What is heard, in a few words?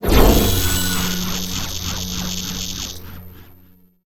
mine; Earth and Beyond; EA Games